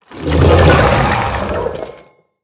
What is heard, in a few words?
Bovine Skeleton